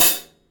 clicky hi hat f sharp key, pedal hi-hat sample tuned to the F# note. Loudest frequency: 11209H; hat samples